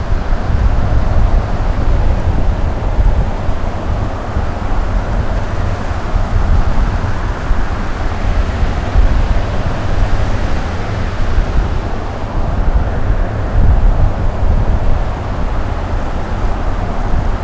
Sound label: Wind